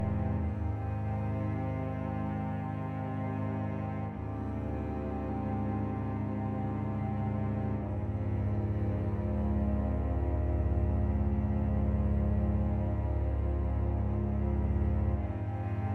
Legato

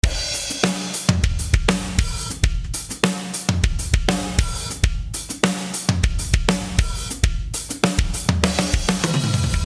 DRUM COMP

